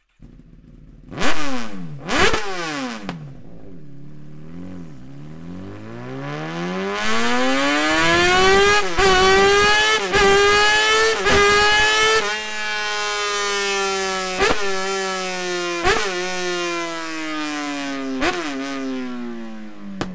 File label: slip on open wo insert optional link pipe; akrapovic